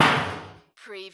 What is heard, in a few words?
Hammer Hit Bodywork